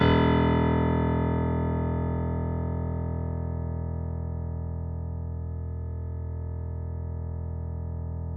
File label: piano; East West; The Ultimate Piano Collection